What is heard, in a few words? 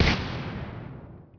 flash; Hidden & Dangerous; h&d demo